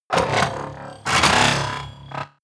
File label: transformation rattle hum